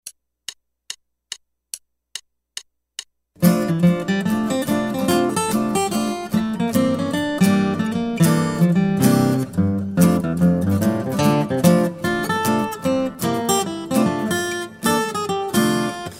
Guitar

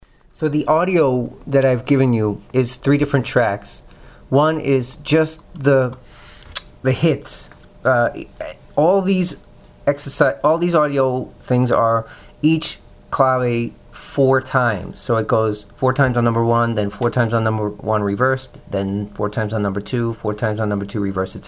claves original clave exercises; 12 claves

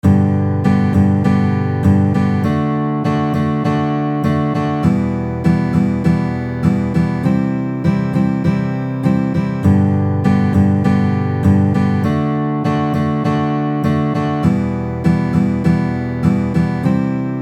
G D Em C at 100 bpm

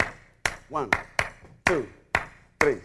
subdivision of 3 starting on fourth partial loop

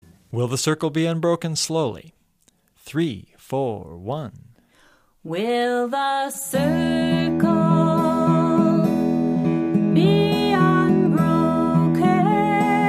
travis picking

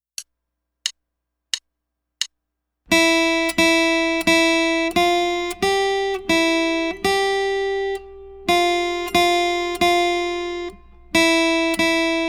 1st String Etude

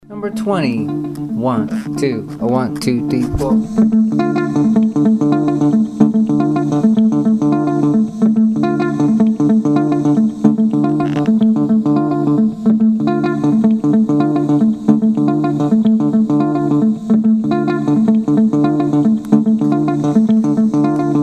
mozambique patterns

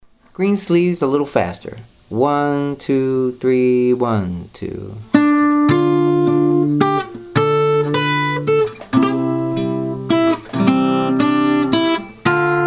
reading play along greensleeves faster; hal leonard

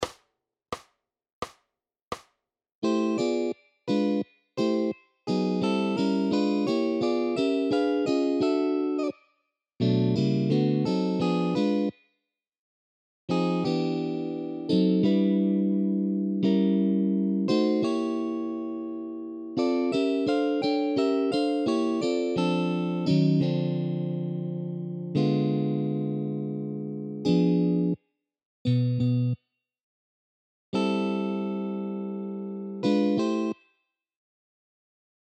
chord exercise at 86 bpm, jazz; Barry Harris harmonization

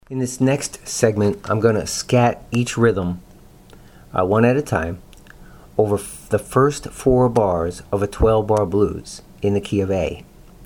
12 claves on blues; intro to 12 claves on blues

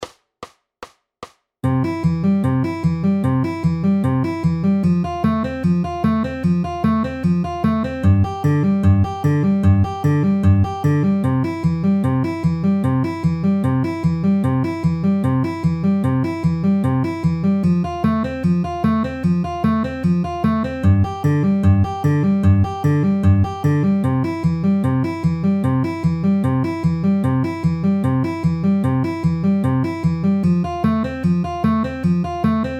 C F G C outer inner ring ring at 150 bpm

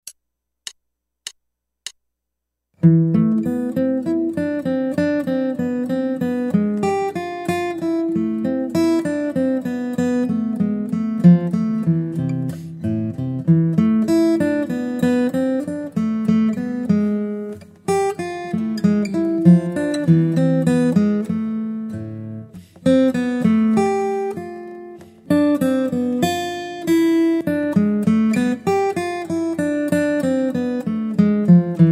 Guitar

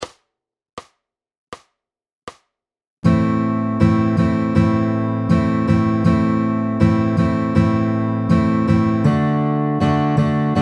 Am Am D D 80 bpm

